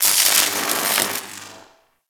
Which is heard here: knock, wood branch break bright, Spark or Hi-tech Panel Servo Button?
Spark